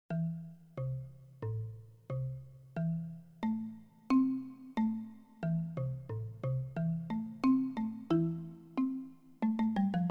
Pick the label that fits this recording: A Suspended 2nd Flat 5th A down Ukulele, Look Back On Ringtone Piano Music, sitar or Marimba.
Marimba